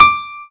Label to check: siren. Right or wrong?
Wrong